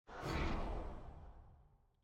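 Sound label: ui fanfare disappear; shaco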